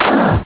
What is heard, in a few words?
fall; opentdm; citrusfrog